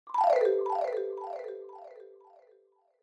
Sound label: Xylophone Down Delay